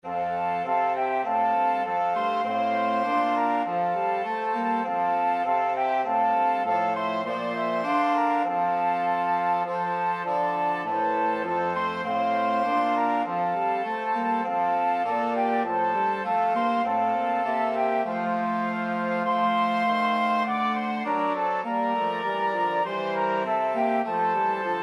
flexible mixed ensemble 4 players

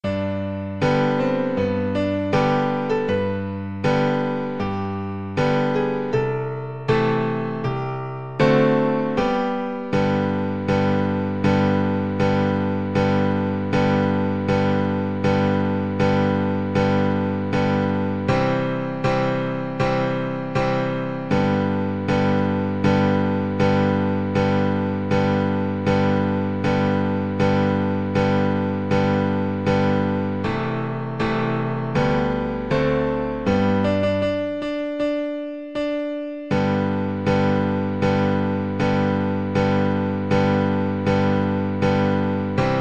battle hymn, cello

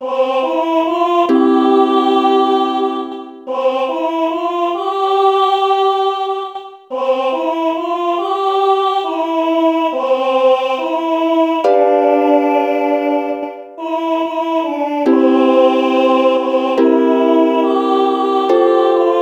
UKE, ukulele

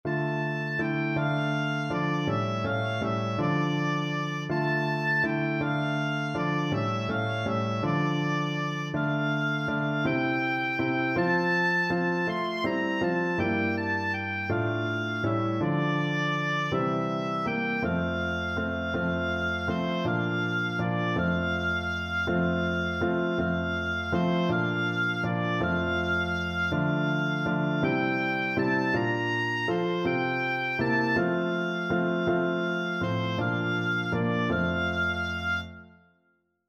we three kings, oboe

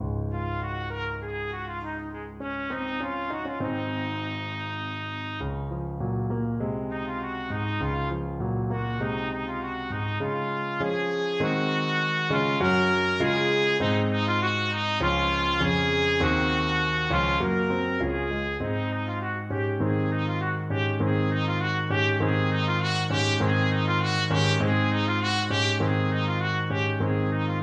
pastoral, trumpet

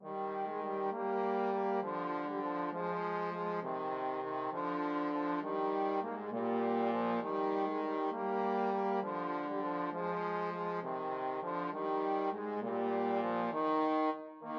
trombone duet

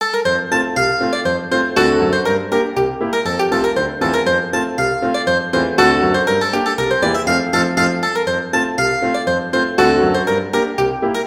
Rheinlander Polka, mandolin

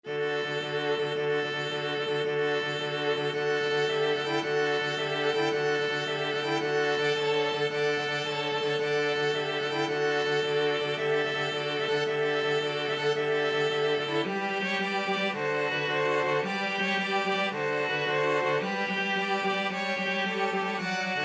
Serenade, string quartet; Berlioz

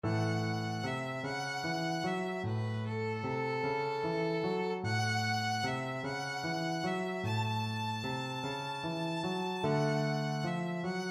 violin

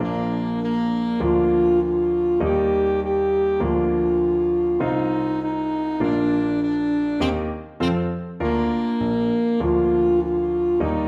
twinkle twinkle, saxophone

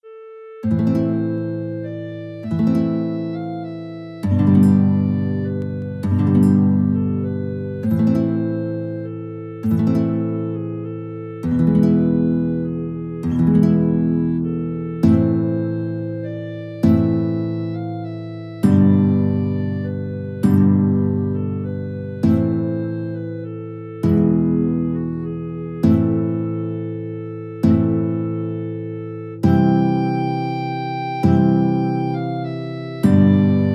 greensleeves, guitar clarinet duet

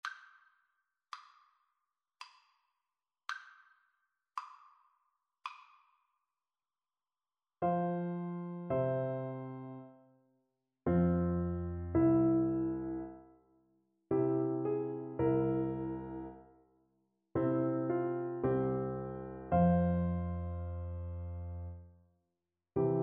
Soprano Saxophone

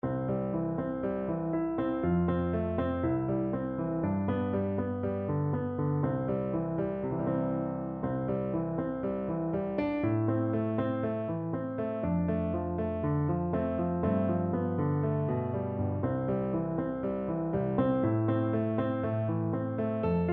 red is the rose, Bassoon

